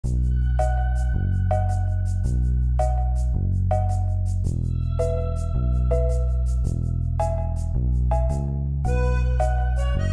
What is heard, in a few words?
Distant Banjo